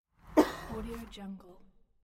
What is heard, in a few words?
single cough outside